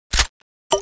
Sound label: reload clip in, weapons; bloodhunter